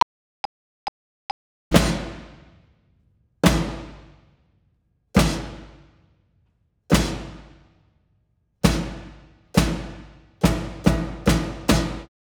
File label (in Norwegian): Trommesolo